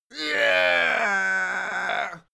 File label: goblin die